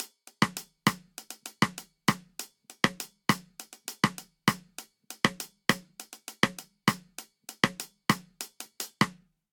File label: Reggaeton Snare & Hihat 100 bpm; 4 4; MIDI Clips; Ableton; Factory Packs; Drum Booth